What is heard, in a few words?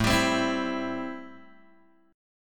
A-Minor 7th A Guitar Open G